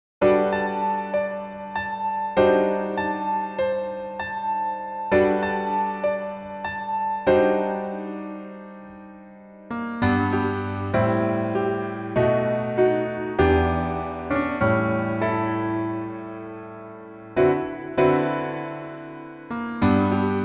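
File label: Piano version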